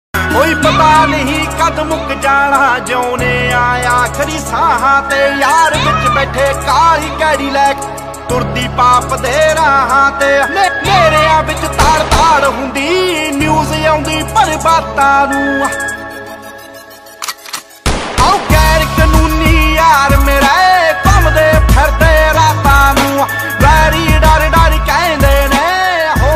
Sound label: punjabi ringtone